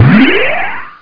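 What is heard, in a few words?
end level; abuse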